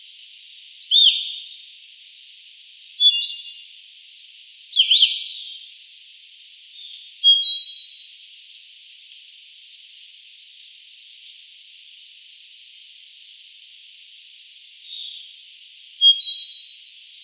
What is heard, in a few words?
Andean Solitaire Myadestes ralloides